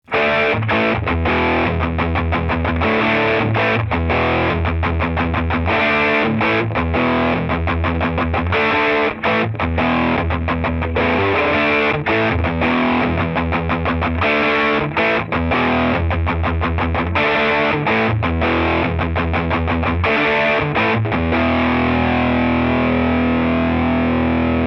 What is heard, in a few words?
rat LP